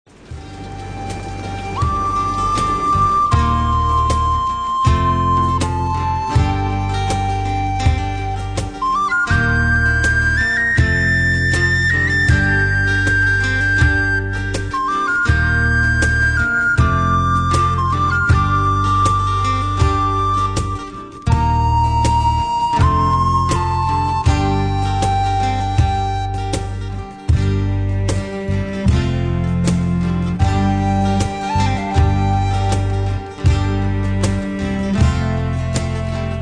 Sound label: Cello